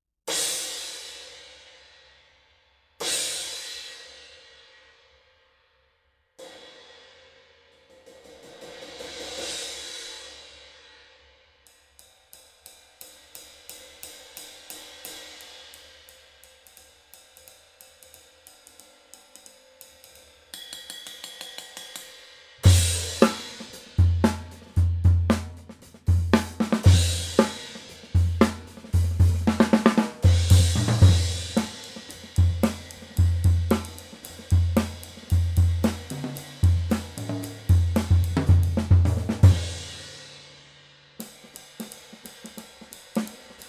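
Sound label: 18 Agop Signature; Istanbul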